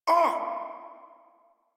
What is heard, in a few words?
Pain Modular Corpus Male; Dialog; Barks; Battle; Warframe